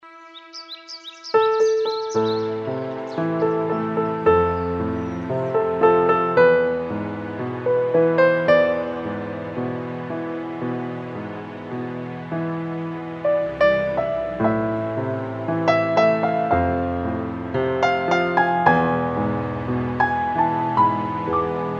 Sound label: Earth water wind and fire sample green music